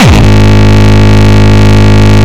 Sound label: Longkick, kicks